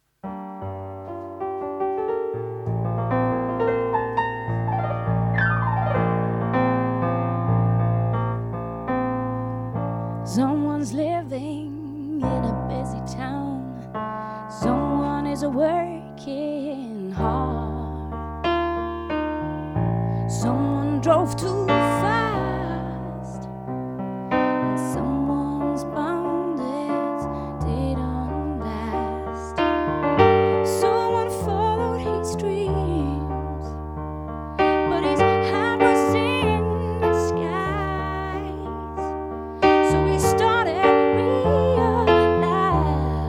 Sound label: Song Live Radio